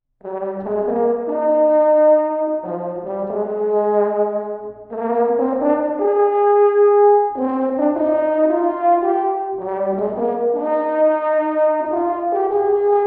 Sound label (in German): Horn